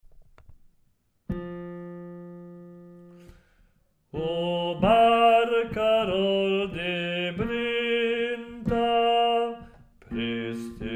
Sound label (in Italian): bassi